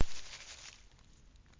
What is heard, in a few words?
Rustle